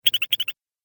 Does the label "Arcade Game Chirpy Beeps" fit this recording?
Yes